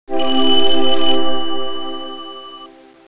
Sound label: phone ring